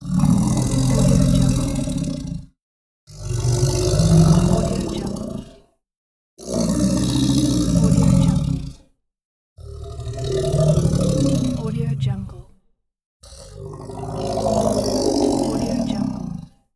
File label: gurgling creature growls